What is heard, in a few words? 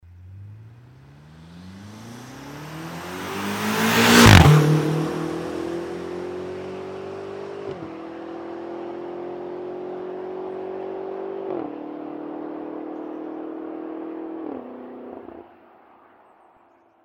BMW M V8 Steady development 3rd to 4th gear; x5m; 2014